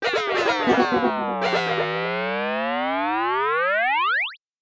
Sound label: TECHNO EXTRA 140